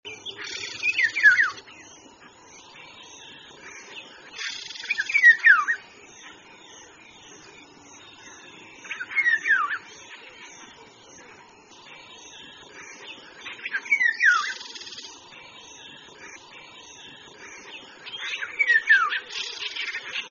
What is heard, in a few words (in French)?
loriot masque, chant